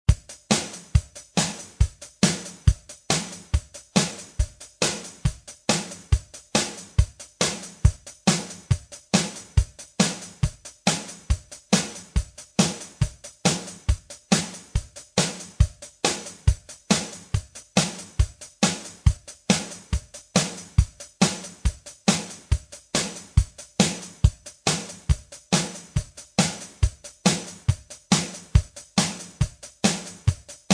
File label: drums